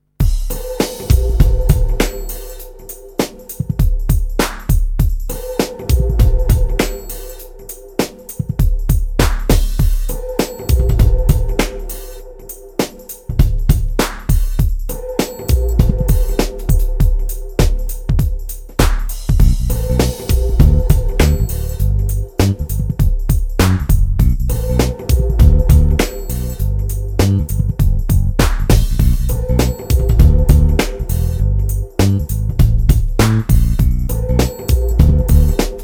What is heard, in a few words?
main music; RS Games Sounds; Rummy